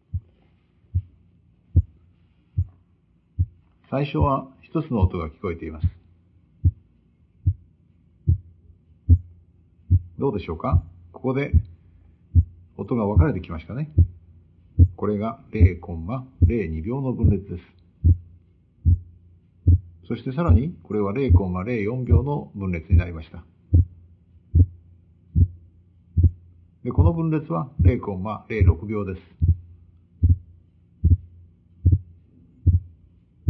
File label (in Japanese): 分裂